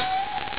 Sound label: PING